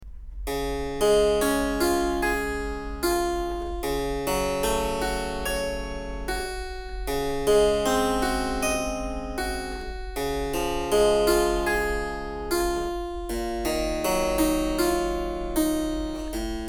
tanze fur clavier des dur; bremische tanze fur clavier v

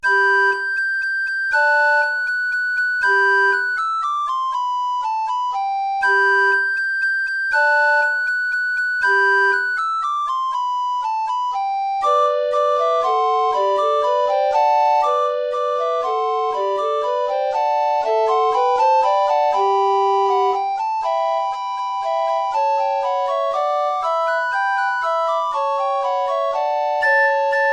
alter ungarischer tanz

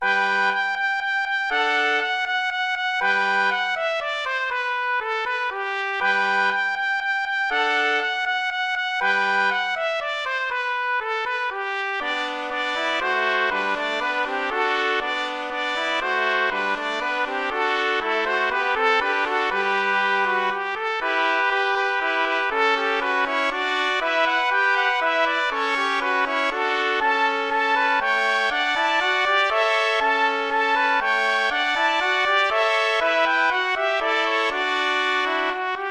alter ungarischer tanz

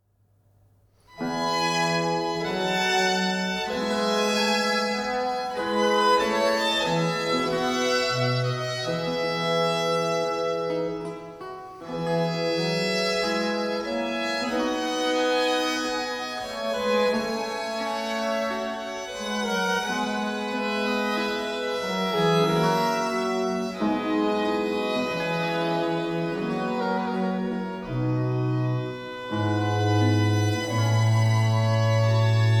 grave aus der triosonate; corelli arcangelo

